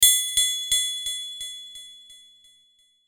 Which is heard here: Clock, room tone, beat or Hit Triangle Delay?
Hit Triangle Delay